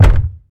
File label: smooth bottom end kick sample d key, Royality free kick drum one shot tuned to the D note